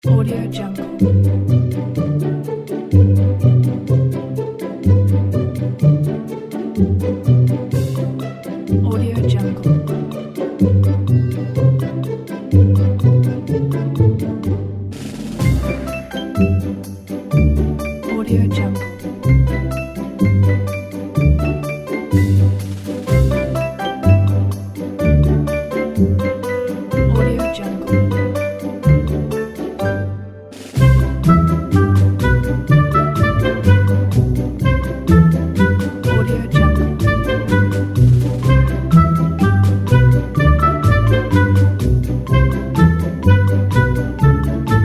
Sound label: mirthful traveling along